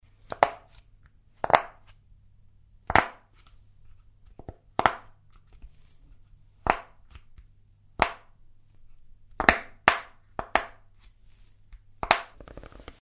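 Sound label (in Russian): звук поп ит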